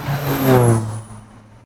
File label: airport